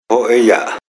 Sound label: drum earth pad